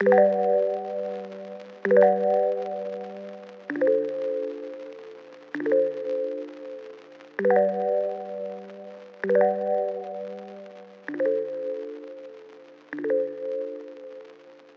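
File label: Mellow Bell 130 bpm A minor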